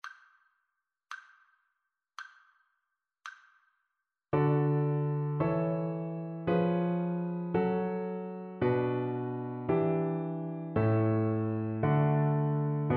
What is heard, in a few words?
harmonic dictation